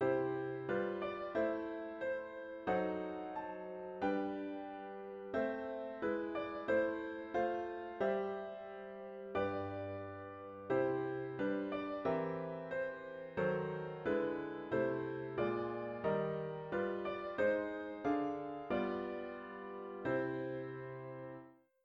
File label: Harm altered modulation